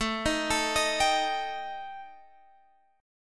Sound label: Eb Major Flat 5th